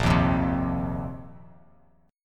A sharp Suspended 2nd Suspended 4th A sharp; strummed; JGuitar

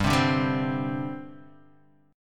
G Suspended 4th, Guitar, Half Step Down

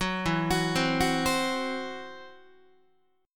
E Suspended 2nd Sharp 5th, Guitar; Esus2#5 arpeggiated; 9,12,9,10,10,11

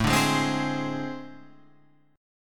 A Minor Major 7th Double Flat 5th, down

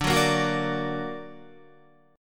D Augmented D down Guitar; D+ strummed; JGuitar

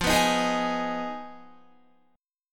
F Minor 7th Flat 5th F down Guitar; Fm7b5; strummed

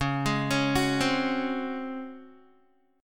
Db Major 7th Suspended 4th Db down Guitar; arpeggiated

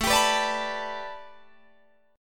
A 7th Suspended 2nd Suspended 4th Guitar Drop D; A7sus2sus4 strummed

